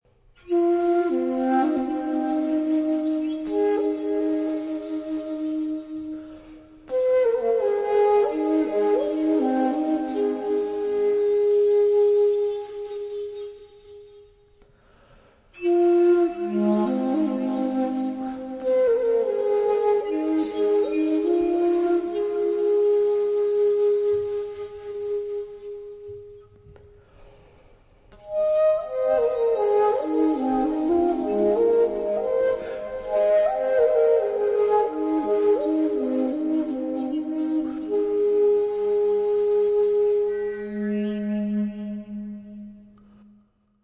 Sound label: anasazi; 古代アナサジフルートの音; RAINBIRD; 長尺を活かした豊かな低音と倍音特有の華やかな高音